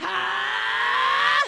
scream; gotenks

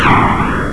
rocket flyby

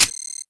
change mode, weapons

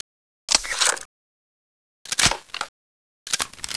sniper gun reload

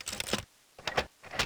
unlock

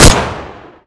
glock18 fire

